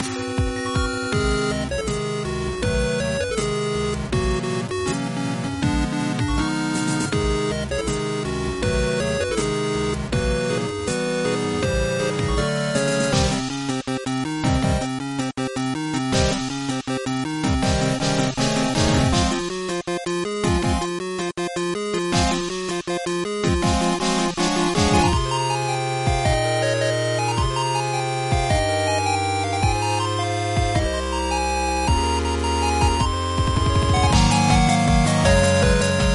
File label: not pass 8bit